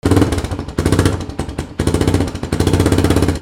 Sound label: VEHICLES